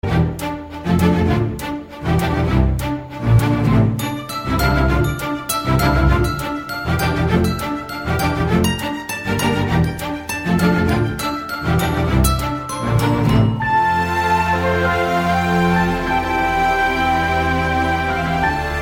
Cool Tone; Mangatha

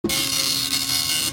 Preeeow

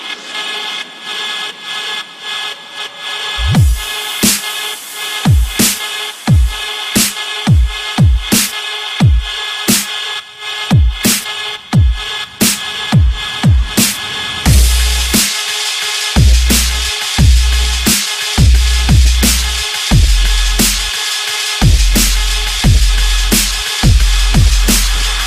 Bass Drop

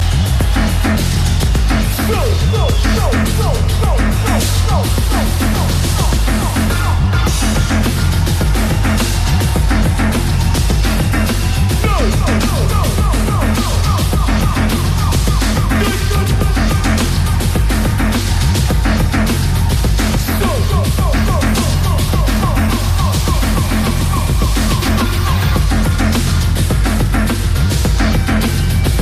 Electronic Bass

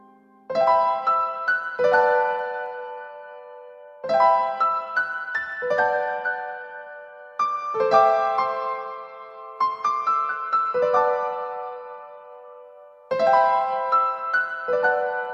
Sad Farewell

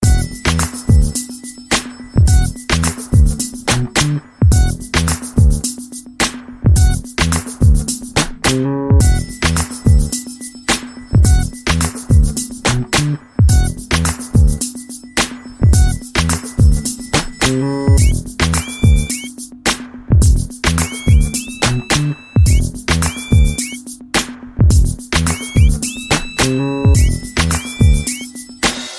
Groove

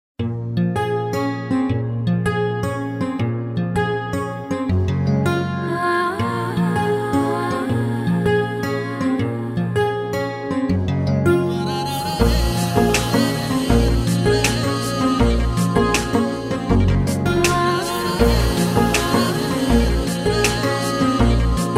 tone piano

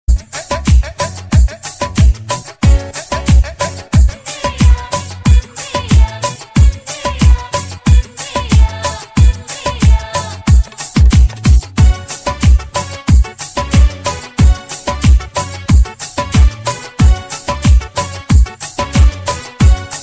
Indian Remix

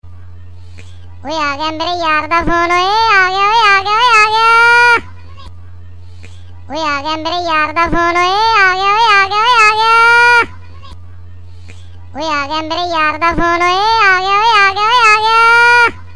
Phone

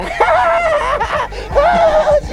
Laugh

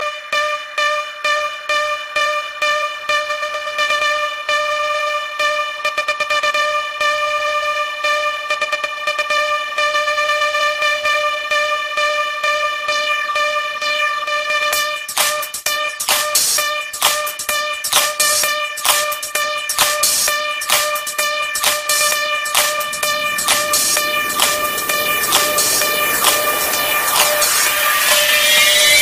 Stadium Dj Mix; Ipl 4 Stadium

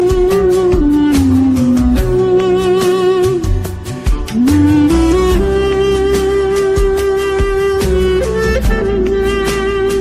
Lovely Sad Music

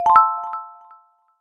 Jingle

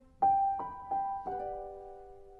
Jingle

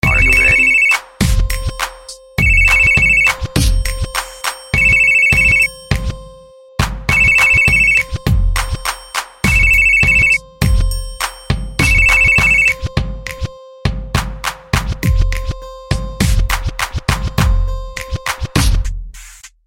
Ring Phone

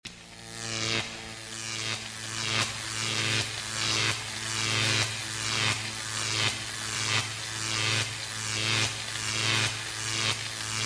High Voltage

